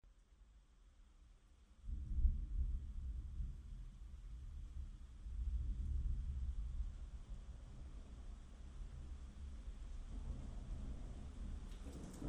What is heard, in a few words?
Sounds of Nature Rain and Thunderstorms